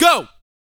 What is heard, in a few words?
VOCAL, R&B Dance, HIP-HOP